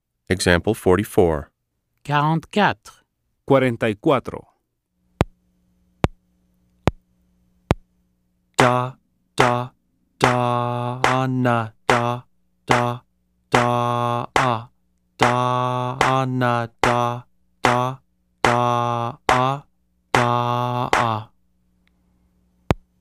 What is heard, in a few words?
Keyboard